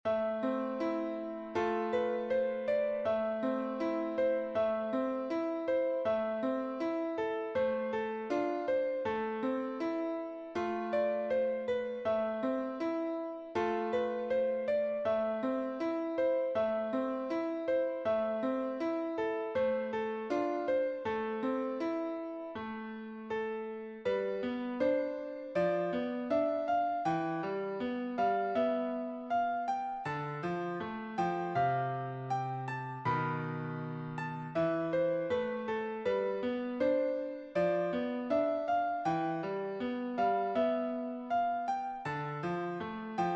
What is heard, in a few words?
piano